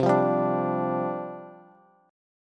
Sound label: C Suspended 2nd Suspended 4th C Bass; strummed; 20,20,17,19; JGuitar